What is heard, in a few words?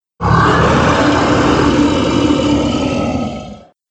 The Golden Dragon, monster; Monster Sound Effects; horror sound effects